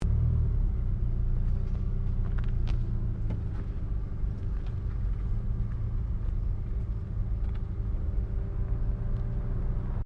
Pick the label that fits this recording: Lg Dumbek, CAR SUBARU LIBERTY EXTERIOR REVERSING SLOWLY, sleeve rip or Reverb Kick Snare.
CAR SUBARU LIBERTY EXTERIOR REVERSING SLOWLY